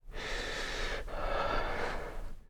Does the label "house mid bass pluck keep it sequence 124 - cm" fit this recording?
No